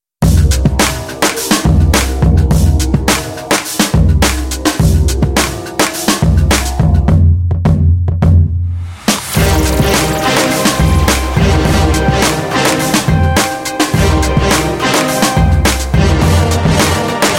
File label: Bed Hip-Hop; PACK BVO BEDS